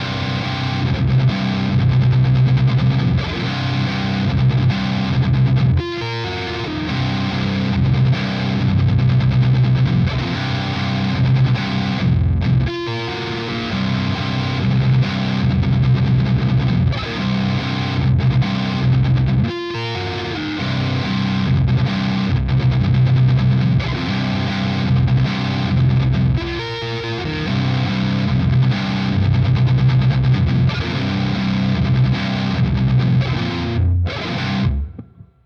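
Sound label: Schecter Omen Elite 7 Neck pickup, guitar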